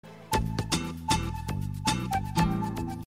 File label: Message Alert